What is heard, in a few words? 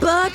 bird